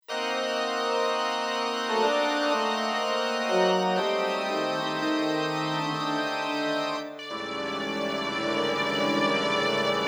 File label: Fanfare; Dragulas